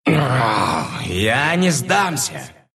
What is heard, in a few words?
death ru; Dota 2; Monkey